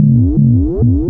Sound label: Emu Wobbles, Emu Modular Drums